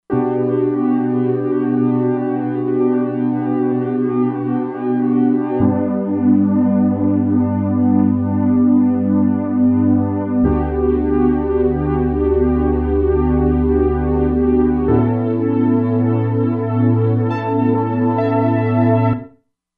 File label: Pad Luna Pad